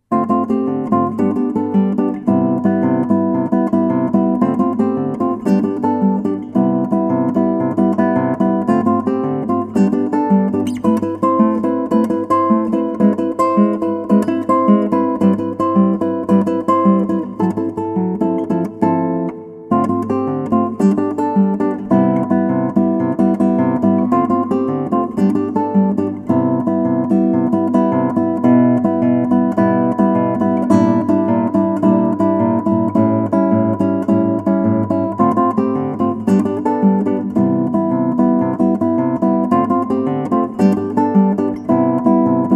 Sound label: samba